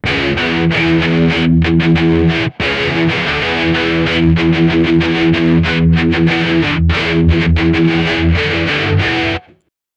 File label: guitar riff chunky and he